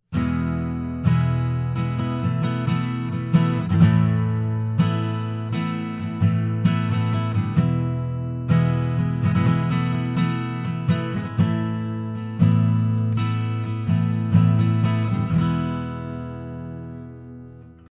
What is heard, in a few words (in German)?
Akustikgitarre; Tascam Ministudio Creator